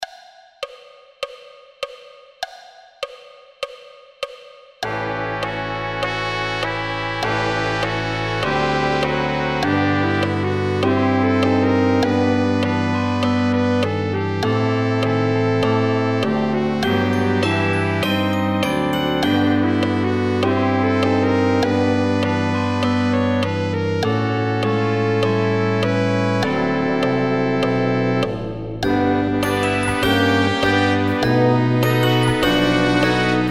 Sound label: guide track with click